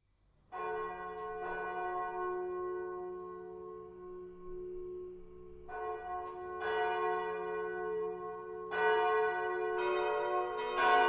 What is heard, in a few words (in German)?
Glocken; Melanchthon